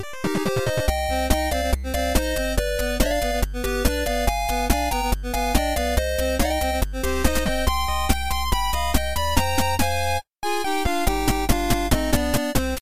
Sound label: 8bit, ringtones